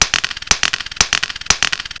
money

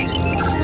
Open

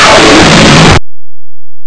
GROWL

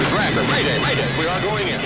crash

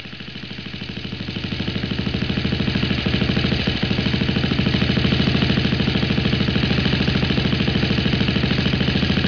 BOAT

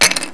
STICKS

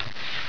CLICK

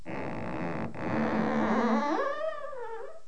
DOOR

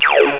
gun

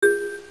ding

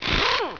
spin

